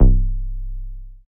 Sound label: SNGL.BASS, Jungle Rave